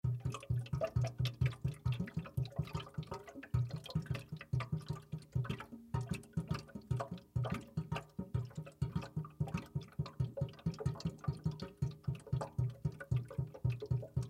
Drips Drain Sink, Water Drips Drain Sink Household Plumbing; Dripping; Blastwave